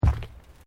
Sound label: Footsteps Boots Plate Metal Step